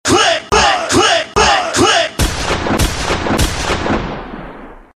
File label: Click Click